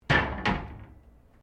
Door Pivot Interior Glass Cabinet Misaligned Close; Various doors; Miscellaneous Doors